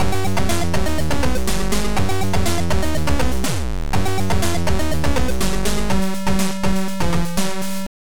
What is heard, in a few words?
Microintromusic